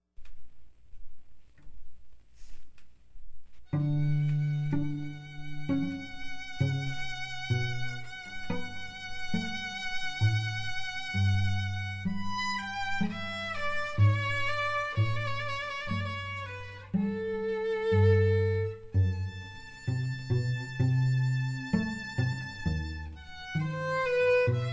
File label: strings